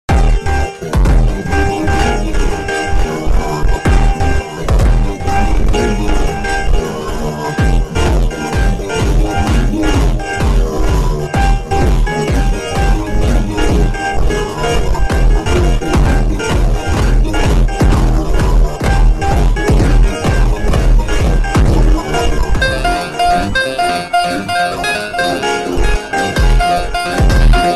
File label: FUNK deep flanger echo